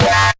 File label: bass c